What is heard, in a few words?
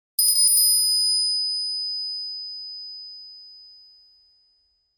bell